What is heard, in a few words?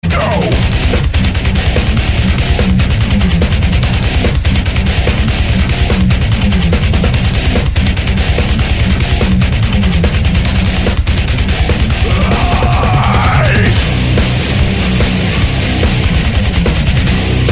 Rock